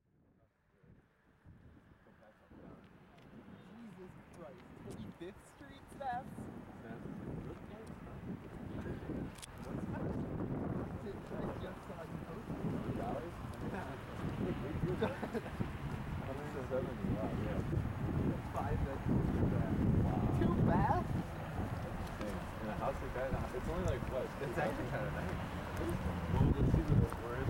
ambience

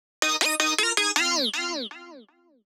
160 instruments bells

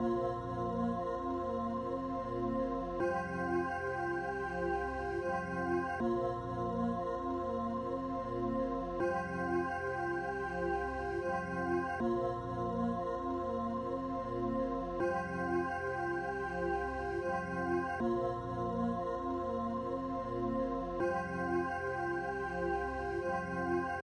bells

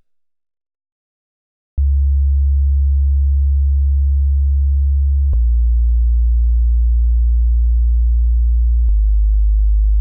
racing sine bass

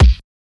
kick

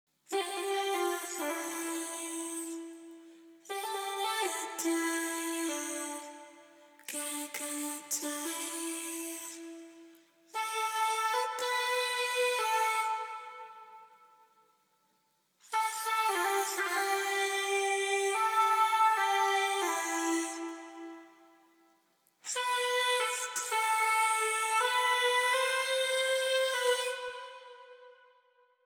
vox

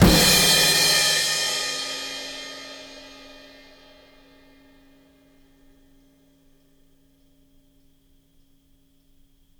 crash

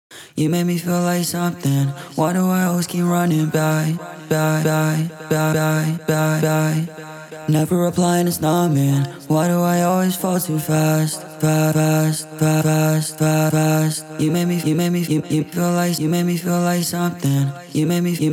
main vocal

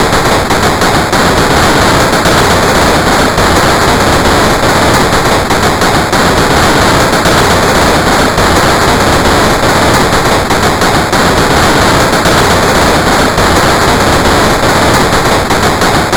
patterns

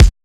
kick boom bap

